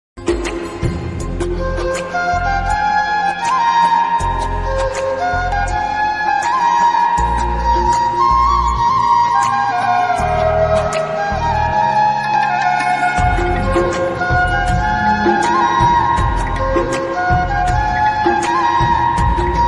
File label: flute ringtone cover music